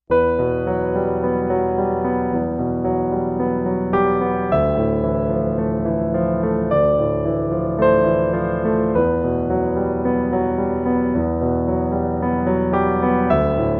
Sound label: Piano Solo